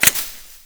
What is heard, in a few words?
impact foliage; Modern Warfare